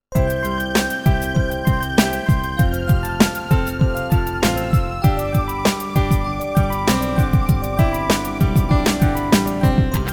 电视包装音乐

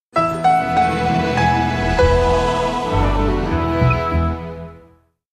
片头音乐

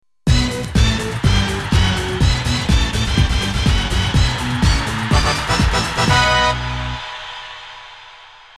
片头音乐

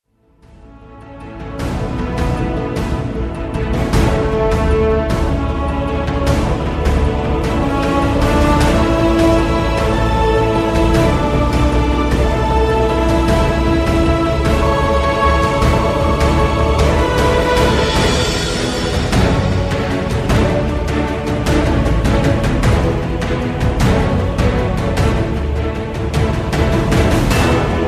企业宣传片背景音乐

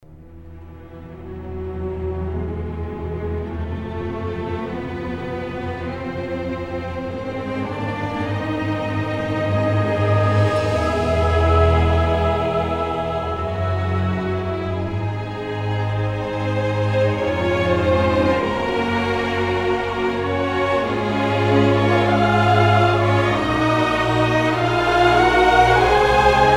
大气的背景音乐

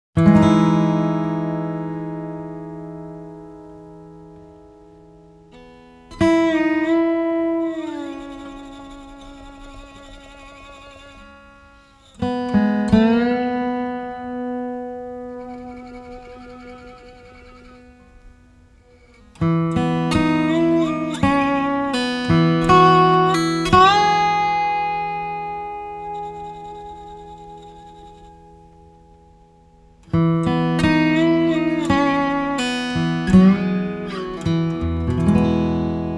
纪录片背景音乐; 3分以内

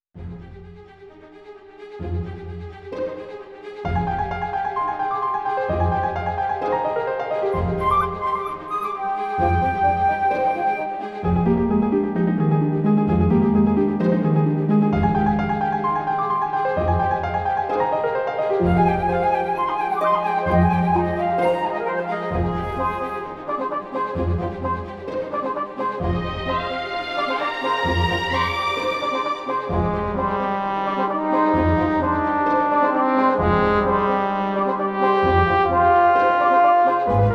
背景音乐; 3分以内

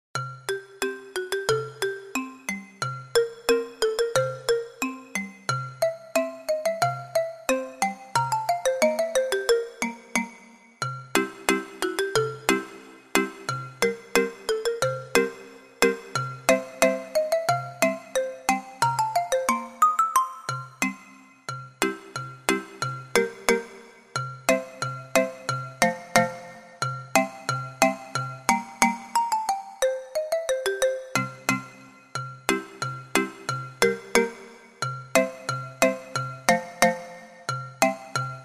讲故事的背景音乐; 1分以内

